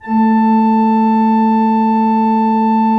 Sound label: A2, Cathedral Organ; ILIO; Propeller Islands Cathedral Organ